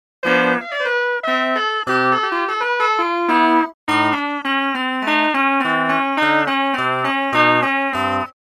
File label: funny keys